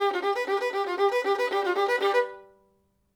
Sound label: violin